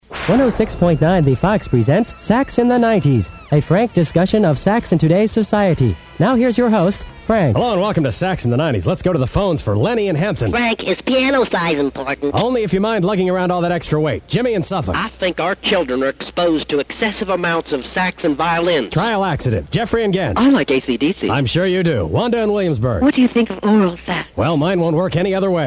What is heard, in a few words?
Sax in the 90s